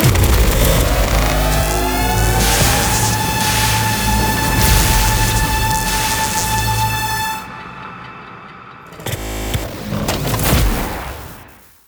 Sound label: big win; BARs 7s; wazdan